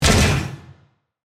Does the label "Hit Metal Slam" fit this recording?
Yes